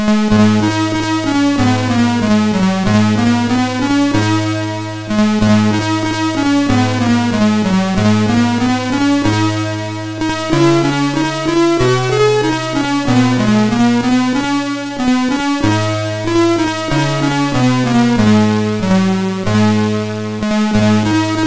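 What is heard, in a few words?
Official Father Christmas Game, MOS 6581 SID chip music, games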